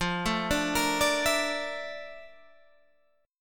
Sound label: Bb Major Sharp 11th F, chord